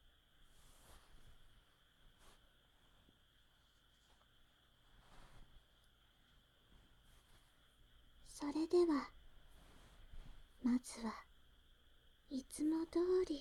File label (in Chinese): 温毛巾